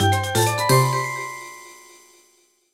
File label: Jingle Game Reward Generic; my baby 3 friends 2010 nds gamerip